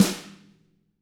HIT, Drums

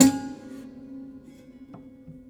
Prepared Piano; Battery 3 Sample Kits

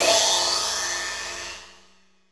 Hoarse Crash, Drum Hits; Zero-G; Soundclash

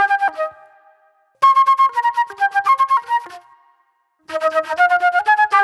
Wild Flute

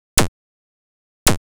Snare, Trance; Sonic Foundry (Sony Creative Software); Trance NRG VOL-1; Drums

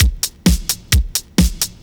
straight, Techno Trance Essentials drum loops

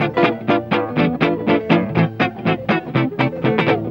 EGUIT GROOVE; Best Service; World Colours

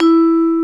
TABLURA

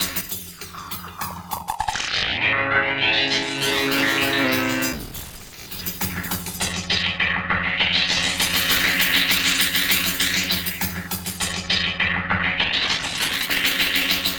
8 Bit Beat, Modular Electronica; Beats; Sonic Foundry (Sony Creative Software)

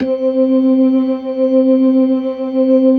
LO MAN V L, LOMANUAL VIB; InVision Interactive; Keith Emerson

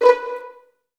PZ B2, strings pizz; ILIO; Kirk Hunter; Virtuoso Strings

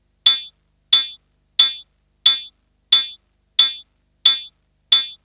Snare Loop

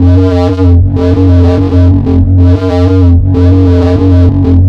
Industrial

